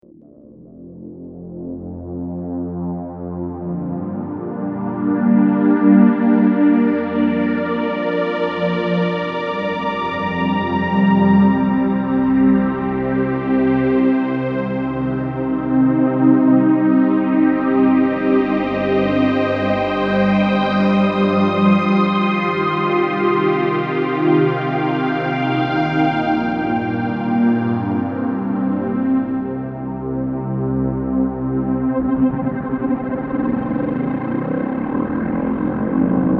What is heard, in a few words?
rotor